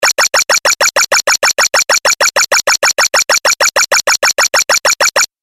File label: 8 Bit Style Collect Spree; Video Game Sound Effects